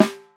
smooth snare sound G sharp key, snare single hit tuned to the G# note